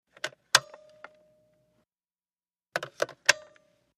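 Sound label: Car Phone Pick Up Hang Up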